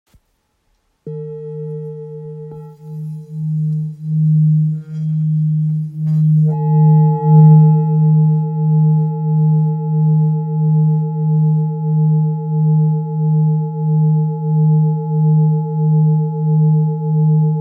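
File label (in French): Bol therapeute re diese, bol chantant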